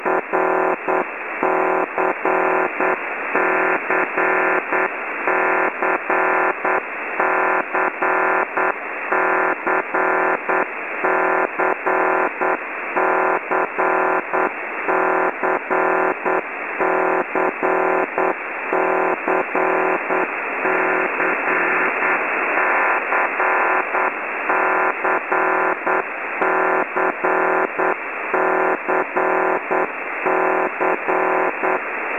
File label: distorted